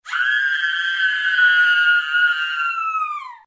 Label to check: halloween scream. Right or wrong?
Right